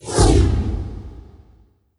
Flame Ignited; Pyrex

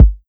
Kick

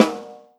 Snare Rock

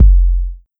Kick 808; pitchglitch samples

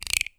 Perc Guiro Ticada

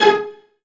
String Beam; pitchglitch

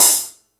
Hi Hat Open, Acoustic; Colombo Acoustic

